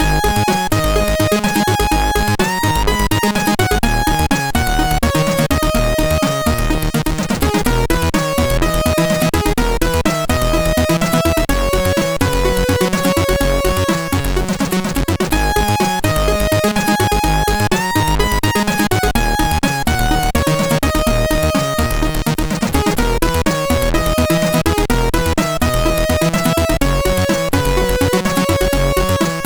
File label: end sequence, sid MOS6581R3; Solitax